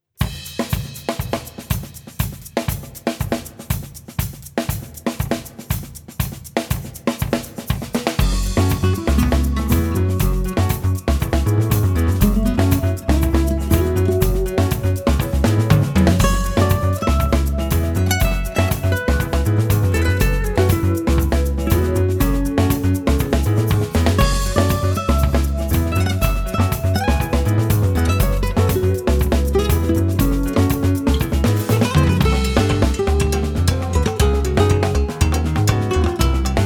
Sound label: Samba Single; drumplayalongs